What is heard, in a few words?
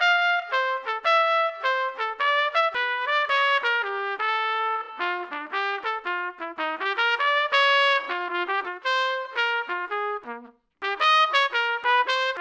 trumpet quite good; Audio Analogies